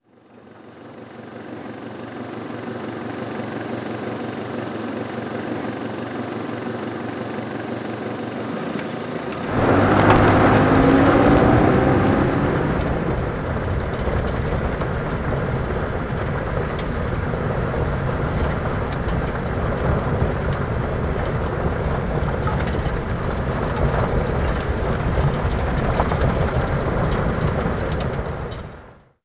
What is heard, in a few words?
car move; coup; Call of Duty Modern Warfare